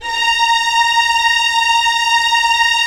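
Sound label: Strings Violins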